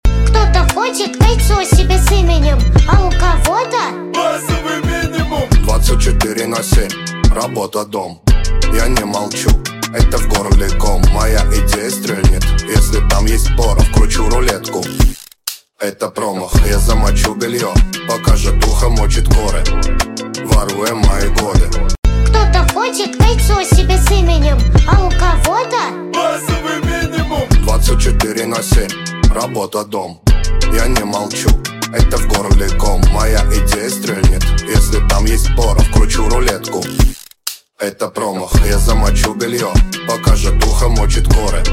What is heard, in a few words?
Soul Funk Version